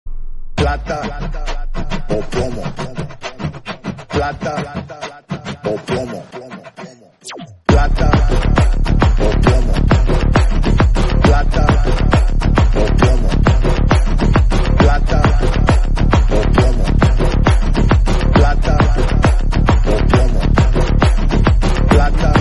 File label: background music, tamil; vikram